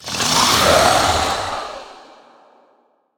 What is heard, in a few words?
Risen Skeleton Attack